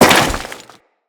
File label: Crewman Step Dirt, Footsteps; Corpus